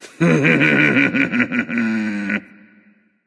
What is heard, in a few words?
laugh; Evil Spirits Bacchus; Smite